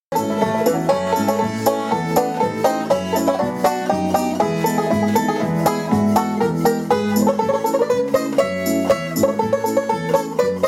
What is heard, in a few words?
triplets, banjo